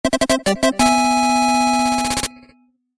Invitation Fanfare (8-Bit); King Tuft's

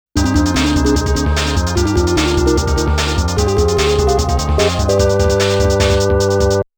Final Round Start MUSIC; Namco Classic Collection Vol.2; arcade

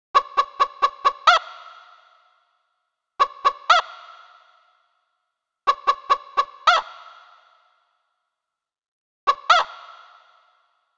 Chickens Ambience; gba; spyro 2 season of flame

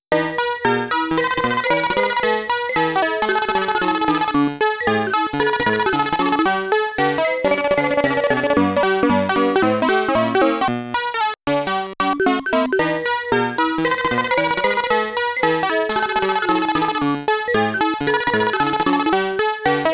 In-Game Music (Main Theme); Mappy

